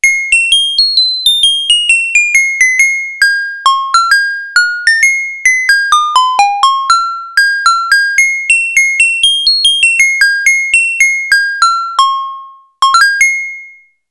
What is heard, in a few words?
GLOCKENSPIEL, Roland SH-101; Factory Patches